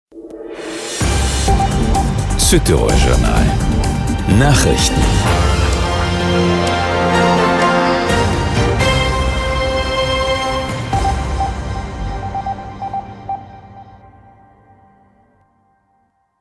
Opener Nachrichten

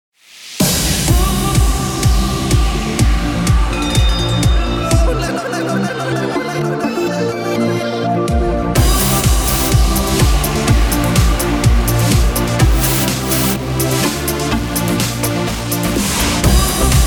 Music Theme Pop Promobett; RADIO OBERLAND

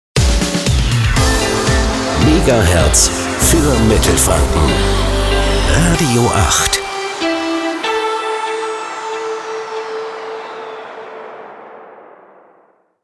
Transition Medium Dance to soft aktuell; Radio 8

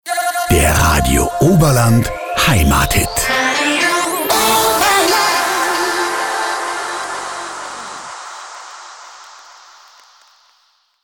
Jingle; Der Radio Oberland Heimathit

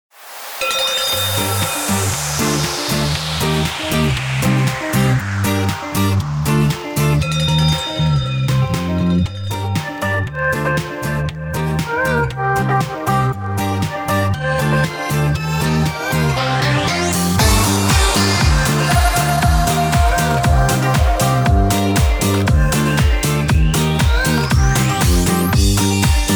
Music Theme Happy Modern Promobett; RADIO OBERLAND

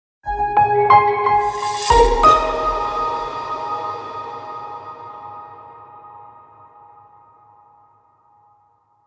Soundlogo; Weltbild

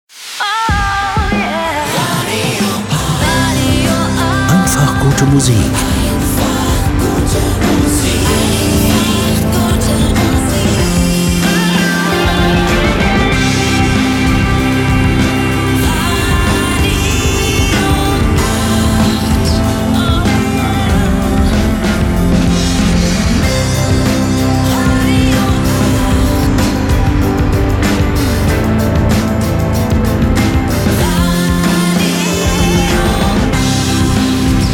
Opener Relaxed Pop Rock LANG; RADIO 8

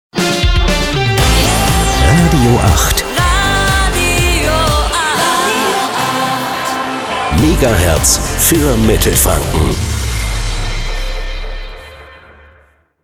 Transition Fast Pop aktuell funky to soft aktuell; Radio 8

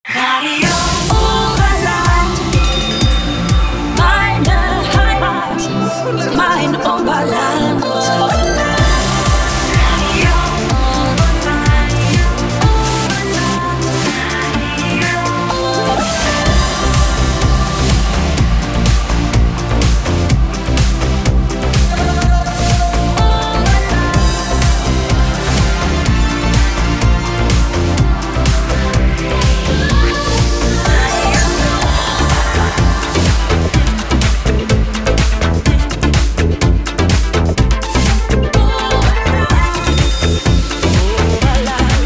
Show Opener spaet ab 16 Uhr; RADIO OBERLAND

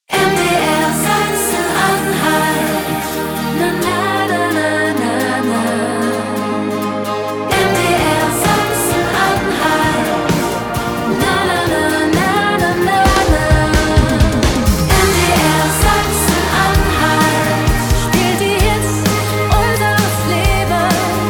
Main Theme 80er Pop Rock

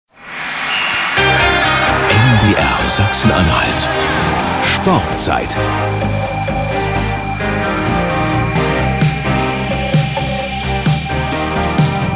Opener Rubrik Sportzeit mit Musikbett

